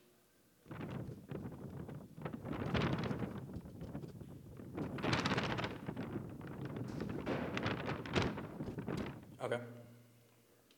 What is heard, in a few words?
Wind Blowing